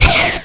gauss, weapons; XDM

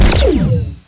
beam rifle fire, weapons; XDM

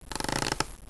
wood creak; XDM; ambience

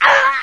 pain; hl1mp; XDM; hassassin

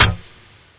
throw switch; XDM; buttons

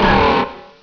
Error; hl1mp

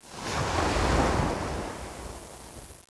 water ocean wave break; hl1mp; dm tropic

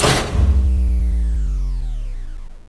power down; XDM; ambience

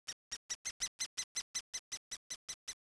bird; hl1mp; dm tropic

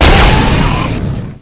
gauss fire, weapons; XDM

